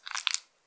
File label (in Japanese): 膣外射精